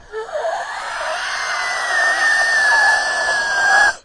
inhaled scream, male; MALE MISC and SPOKEN WORD

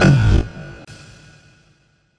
reverb vox kick; Kicks; single hits

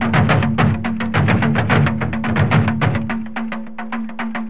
tribal loose drums, 107 bpm; 101 to 110 bpm

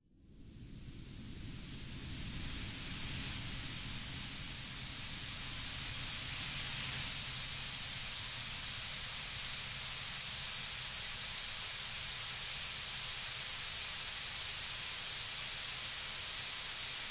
very bright noise, Noise Sweeps

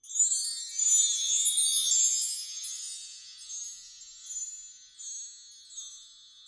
bell tree tinkles; Western and Latin Percussion; single hits

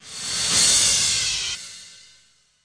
backhit crash gliss down; Cymbals; single hits

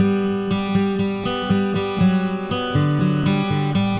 acoustic guitar picking; GUITAR LOOPS

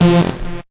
oldskool, Synth

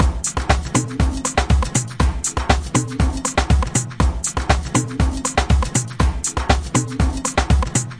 120 house, drum loops

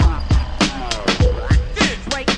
101 rap with distortovox; 101 to 110 bpm

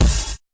fx drum

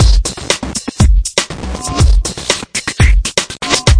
bitcrush n groove, 120 bpm, drum loops and breaks

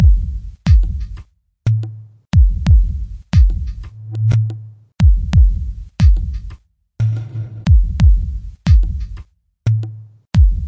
090 minimal thumpy percussion

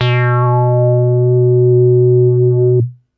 tight square B4, Tight Square Bass

